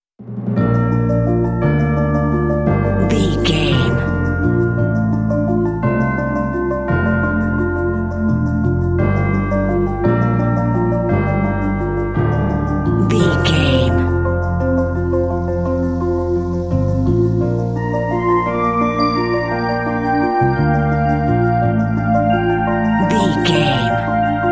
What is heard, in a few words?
town music